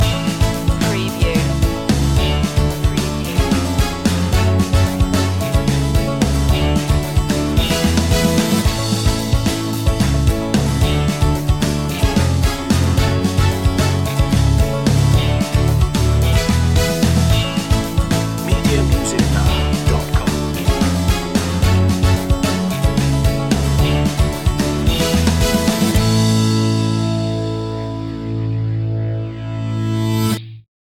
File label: power pop goes bang